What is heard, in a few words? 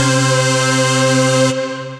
Large lead, Synth Lead; Ableton; Factory Packs; Build and Drop